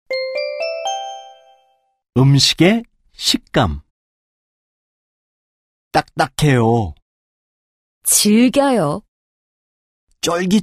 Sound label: K-POP